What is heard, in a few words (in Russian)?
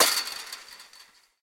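резкий звук металлического дребезжания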